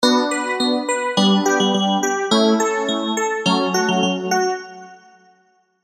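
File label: Spire, keys; Miami Vibe; alonso happy holidays 2021 spire soundset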